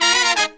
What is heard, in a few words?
132, Pop & Funk Brass